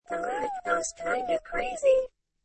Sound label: hohoho